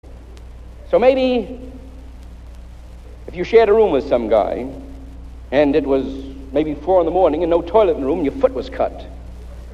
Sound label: piss